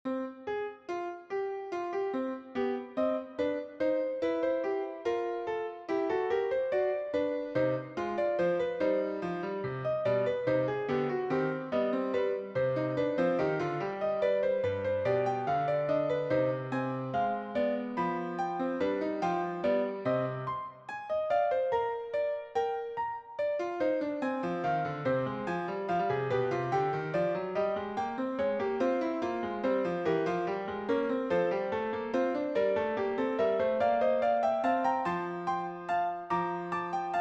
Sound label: fugue